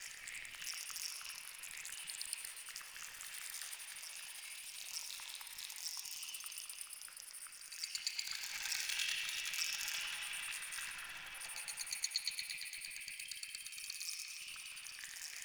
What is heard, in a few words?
Critter Ambient Stereo Loop; Props; Warframe; Tau; Ambience